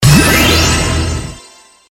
win normal; slots; sRoma

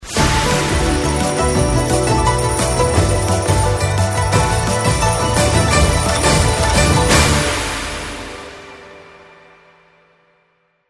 win Big incrementing music; Holy Goat

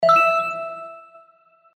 Wild, slots; Holy Goat

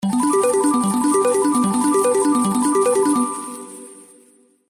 win incrementing; Candy Bona

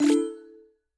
ui close

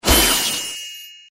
symbol combo; slots; sRoma

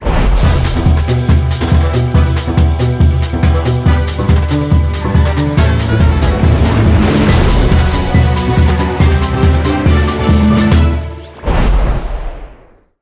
win Mega incrementing music; Highway B

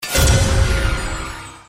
bonus run button; slots; sRoma

